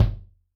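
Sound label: bass drum, Kick, Yamaha DTXpress-II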